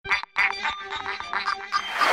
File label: Rock Msg